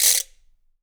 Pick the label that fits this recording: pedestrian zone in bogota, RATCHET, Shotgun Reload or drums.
RATCHET